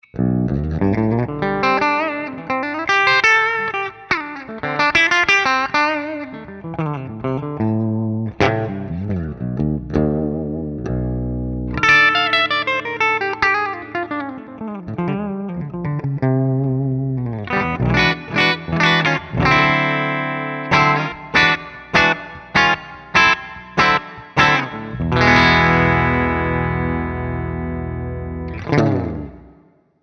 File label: LTD Baritone Arctic Metal Clean Humbucker